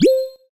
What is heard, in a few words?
ios 12 ringtone